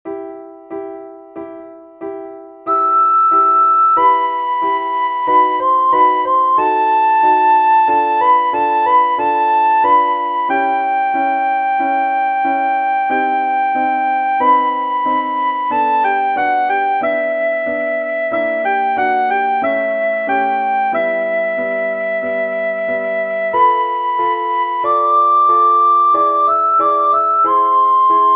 Andantino sopraan; Flierefluiter 5

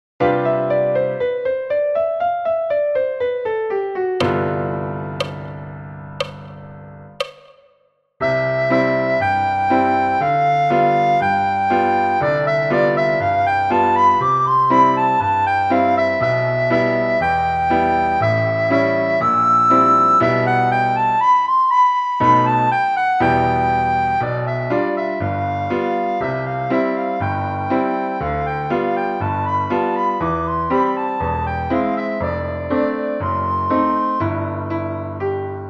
Klaverweide sopraan; Flierefluiter 5

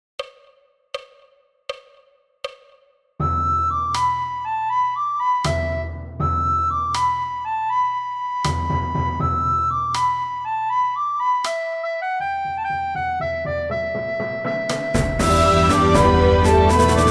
sopraan; Flierefluiter 5

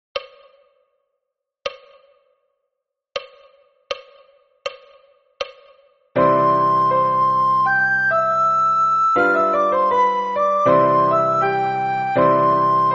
langzamer sopraan

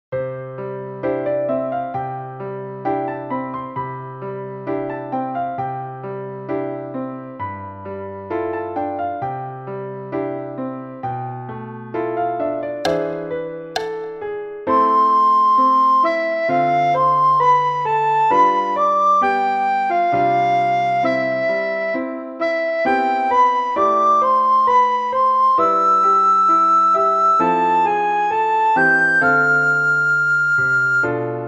sopraan; Flierefluiter 5

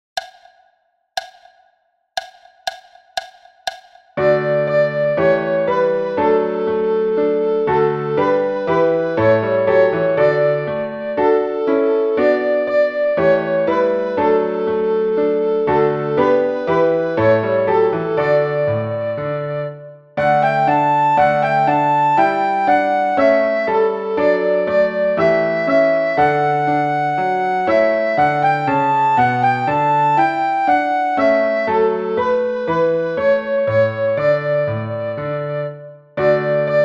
Rigaudon, alt; Blokfluitles in Utrecht

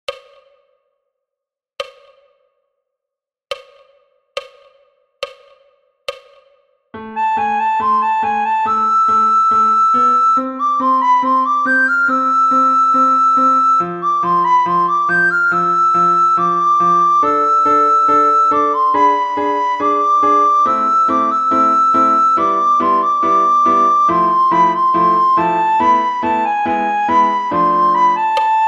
sopraan; Flierefluiter 6; Deltarune

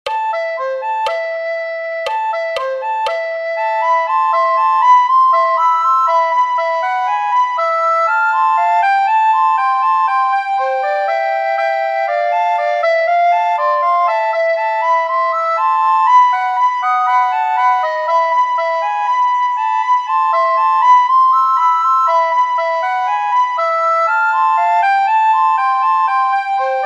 Duet sopraan; Castle of the Sky; Flierefluiter 7

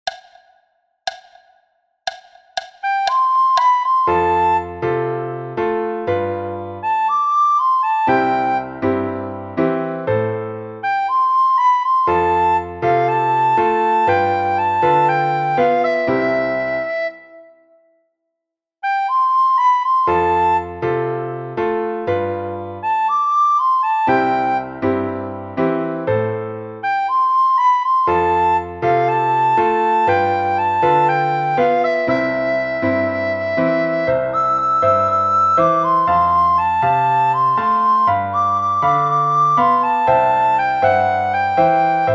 sopraan; Flierefluiter 6

